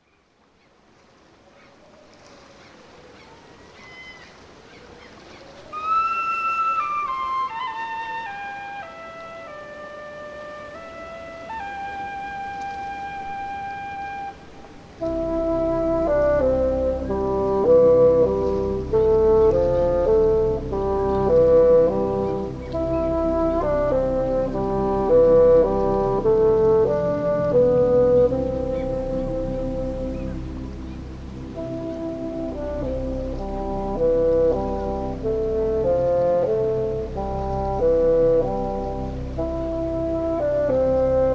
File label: soundscape; Blow the Wind Southerly